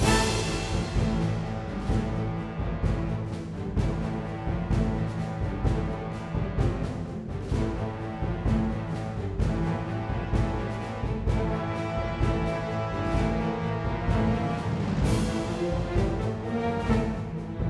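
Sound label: Music Boss Theme Bpm128 Loop; asterix obelix slap them all 2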